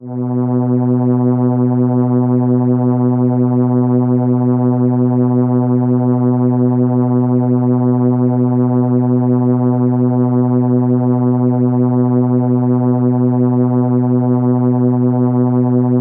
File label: Cello B3, ARP Solina